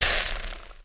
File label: Liquid Burnt